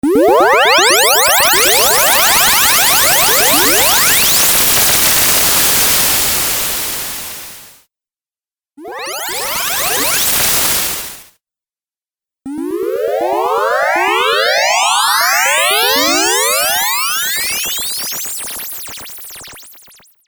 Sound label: Teleport Warp Video Game Soundpack; Video Game Sound Effects